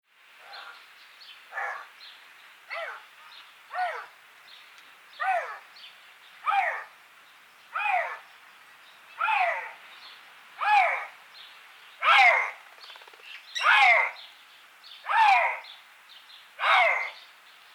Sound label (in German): Luchs Rufreihe